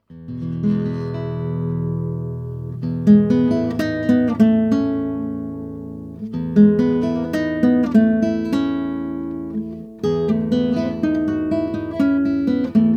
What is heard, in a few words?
Nylon Guitar; American D331; figure-8 ribbon microphone